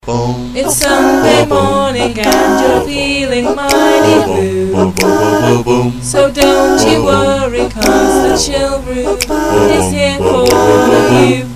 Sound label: jingle; chill room; Fuse FM